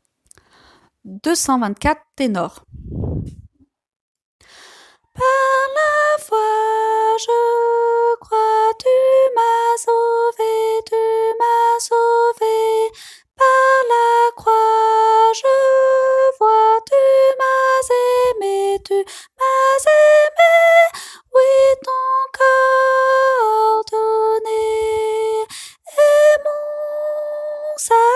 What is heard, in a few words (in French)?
ténor